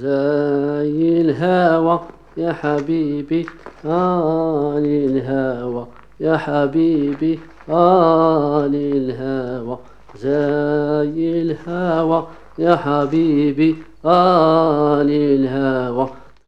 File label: SINGER; Best Service; Ethnic Journey Sound Cube